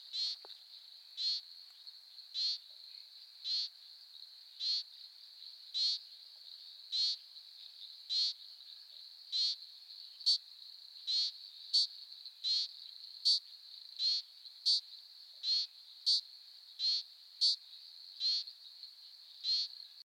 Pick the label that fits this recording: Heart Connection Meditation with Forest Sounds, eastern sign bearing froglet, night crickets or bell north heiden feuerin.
eastern sign bearing froglet